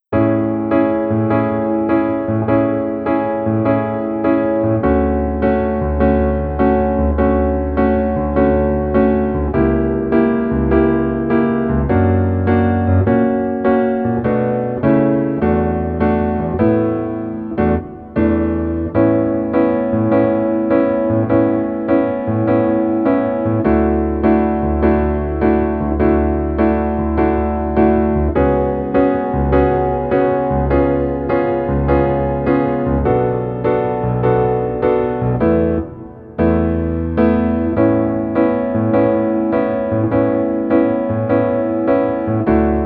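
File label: Piano version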